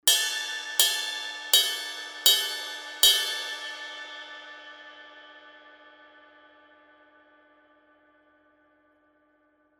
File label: 23 a zildjian sweet ride, bell hits